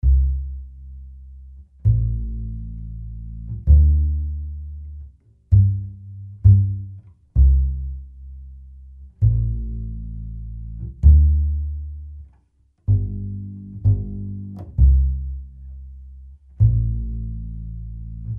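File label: 65 C Bop Double Bass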